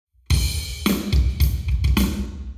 Hall Drums 0deg; eMagLS

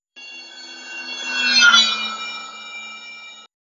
passby pressure total

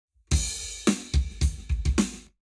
Lab Wet Drums 0deg; eMagLS

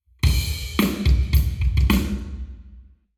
Hall, Drums 0deg; eMagLS

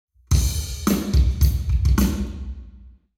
Hall Drums 0deg; eMagLS

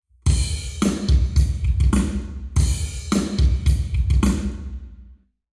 Hall, Drums 0 to 360 deg

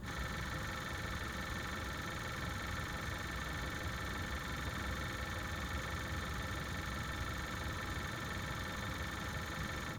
Combustion, vehicle

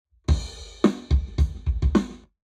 Drums, 0deg; Lab Wet

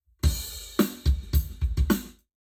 Lab Wet Drums 90deg; eBreve

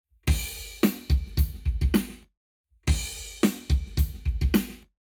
Lab Wet Drums 0 to 360deg; eMagLS

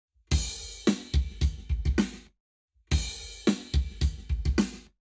Lab Wet Drums 0to360deg; eMagLS

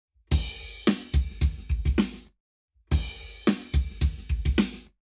Lab Wet Drums 0to360deg; eMagLS

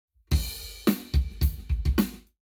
Lab Wet, Drums 0deg; eMagLS

